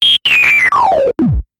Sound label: notify